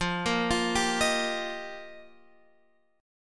F 7th Suspended 2nd Suspended 4th Guitar Half Step Down, chord